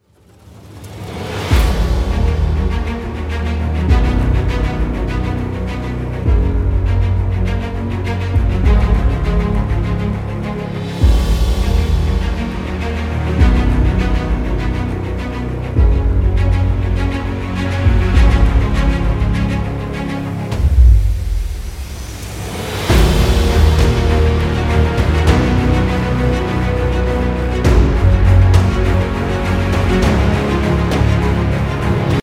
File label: epic dramatic teaser, ringtone; MIUI12